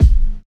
kick drum single shot g key, steel kick drum sound tuned to the G note